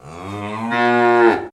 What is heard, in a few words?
Cow Classic Moo